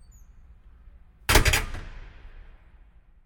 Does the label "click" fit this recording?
No